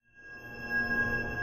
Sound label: Gun Pre Shot; Game rip; MiSide